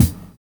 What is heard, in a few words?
LOW TOM; Univers Sons - Kitch 70s